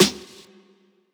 Snare Reverb, drums

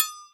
Flexitone; Drums; Misc Percussion

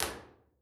Real Places Fox Caves Wide R; Impulse Responses; Ableton Live 12 Lite; Hybrid

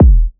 Kick Analog

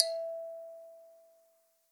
Ikembe E1; Multisamples; Ableton Live 12 Lite; Core Library

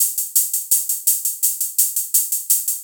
Hihat 84 bpm; Loops; Drums; Top; Duck Hunt; Ableton Live 12 Lite; Core Library

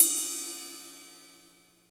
Ride; Drums; Ableton Live 12 Lite Core Library

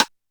Rim 505

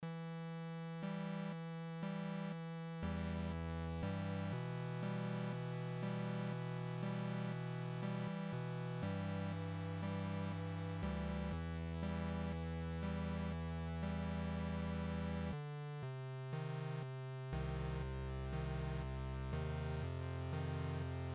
test 8bit; Chubble

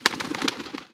bird taking off; creatures

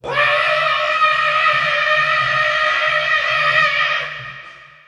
Vocal Screeeem; Ableton Live 12 Lite; Core Library

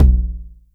Tom 909 Low; Drums

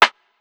Rim, Drums

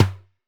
Tom Mid; One Shots; Drums

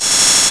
Swish In (Pre-Door Close)

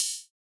Hihat Open Metal

